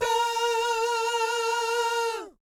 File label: VIB FX, Jazz FX